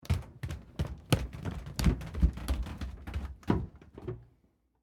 Carpet Stairs Down, Footsteps, Carpet, Stairs, Down, Underneath, Perspective; BlastWave